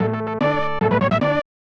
Victory!; 7th Saga; NES

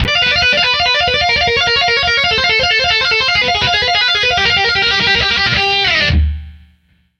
Guitar Shred

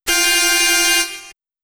BUZZER!; ボールジャックス

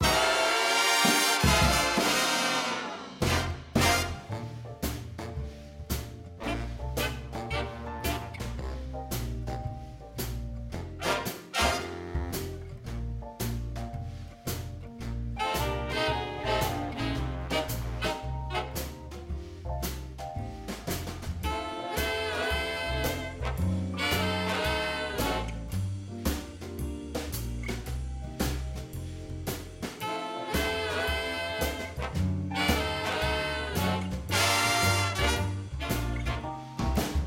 Karaoke Jazz; The Sims 4 City Living; macOS; PS4; Windows; Xbox One; Xbox Series X/S

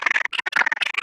Angry Screech; Lethal Company

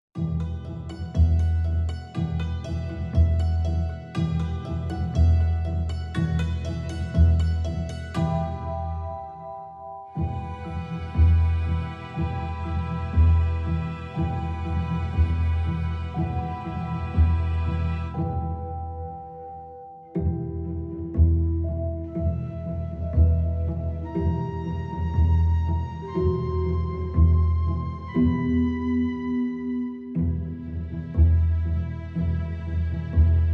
Music Loop 32 Bars; Agent A: A Puzzle in Disguise; Windows

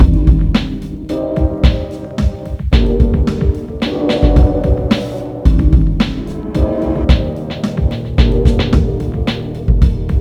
Hip Hop; Wormhole; puss soundtrack

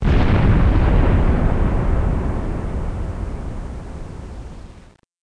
Explosion Far; Learn to Fly 3

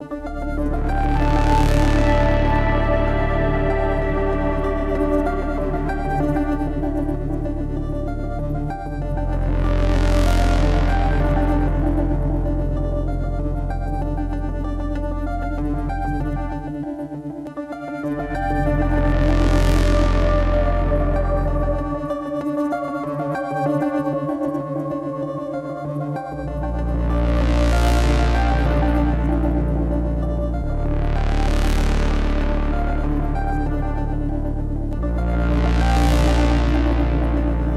Moon Patrol Pad Loop

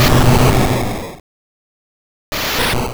Self Destruct; Pokemon; Gen 1; attack moves; RBY

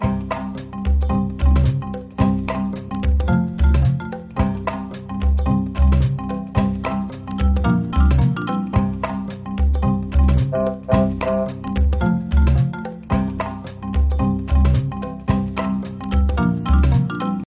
Jungle Level

